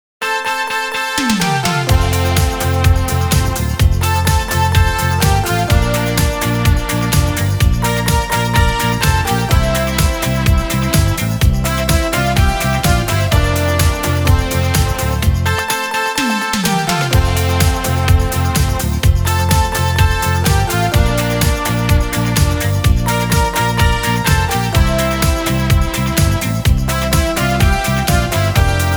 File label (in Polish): karaoke